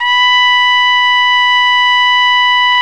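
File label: BRA TRUMPE, trumpet, brass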